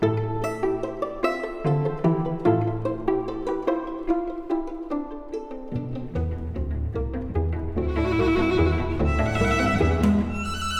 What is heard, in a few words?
String Quartet in F Major; Assez vif, tres rhythme